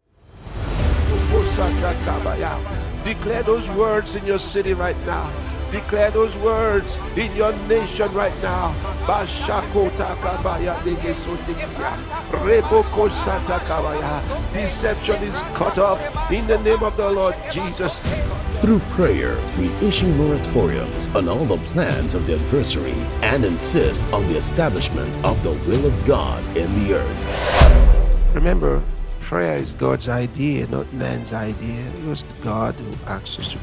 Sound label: JINGLE